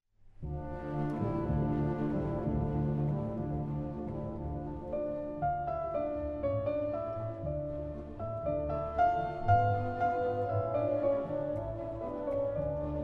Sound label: Piano Concerto No. 3 in D minor; Rachmaninov